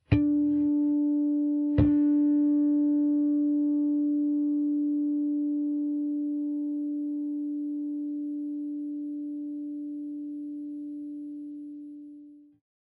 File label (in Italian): Corda RE armici senza battimenti, basso; suonare il basso